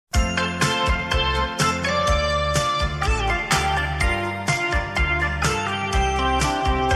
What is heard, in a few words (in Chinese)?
游戏成功结尾